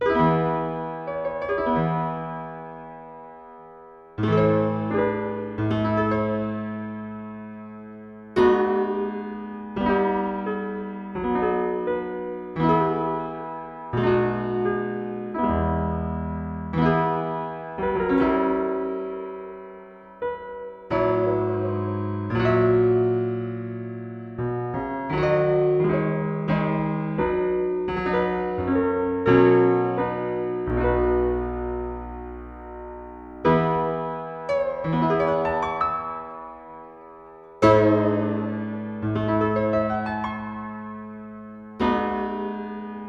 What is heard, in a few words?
sarabande